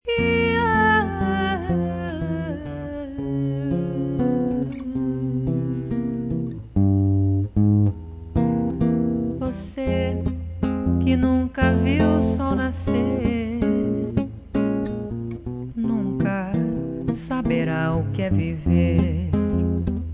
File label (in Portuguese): samba